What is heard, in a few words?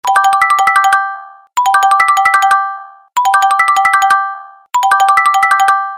crack; Iphone